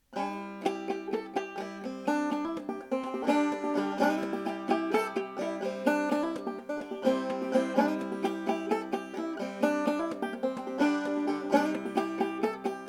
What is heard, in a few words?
banjo